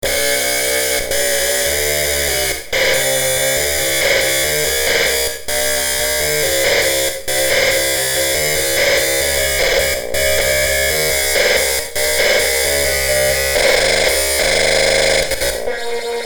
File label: boing verb; blueboing